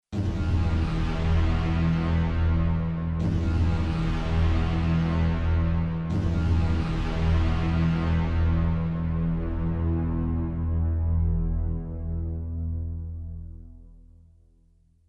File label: Sustains Soft Blurry Particles; Elysion 2